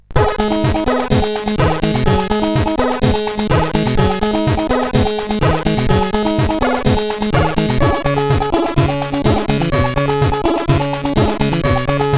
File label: Acid, CSG8580R5